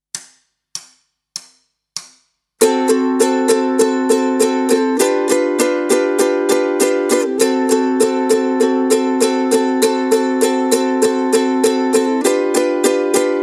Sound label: Blues, Ukulele